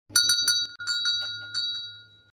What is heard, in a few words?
shopbell